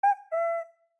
Bird Cicada Notification Day

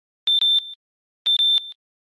galaxy watch beep beep

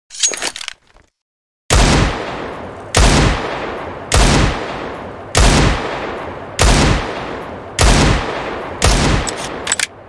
desert eagle csgo, opuzhyia

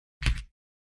keypress return

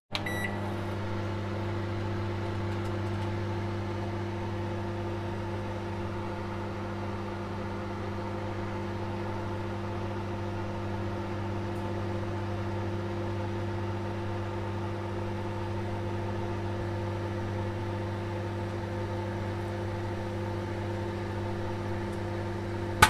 pop

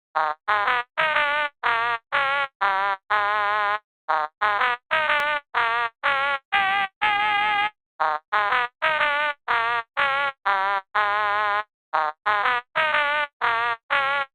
spooktune; undertale

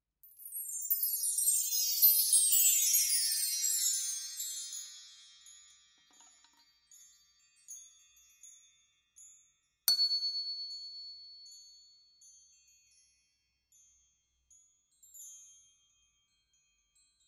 bar chimes

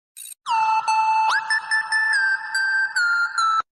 acer ringtone

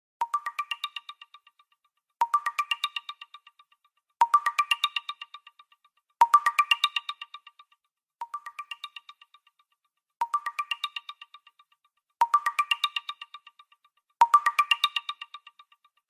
sony xperia z bingo

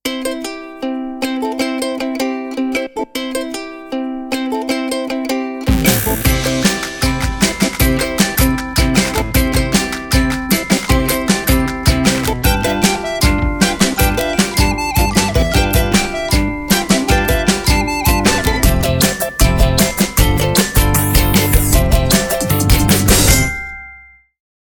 Brimful, Nokia Lumia

